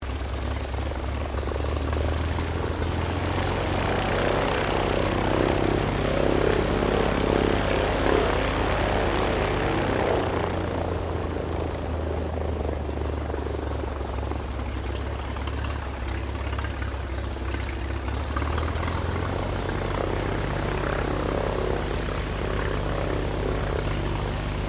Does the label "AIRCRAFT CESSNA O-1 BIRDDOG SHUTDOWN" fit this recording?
Yes